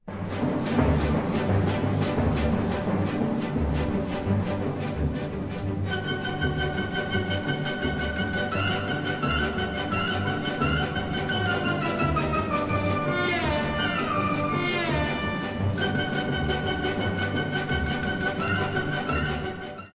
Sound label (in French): danse sabre; khatchatourian